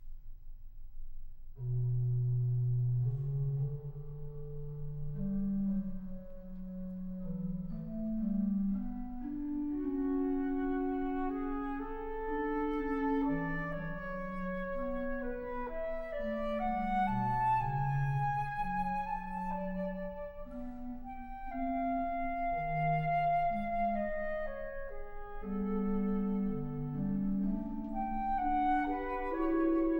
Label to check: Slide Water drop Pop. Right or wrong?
Wrong